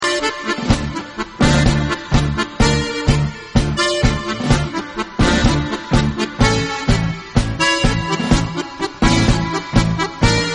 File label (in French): tango melancolique; 3 musiciens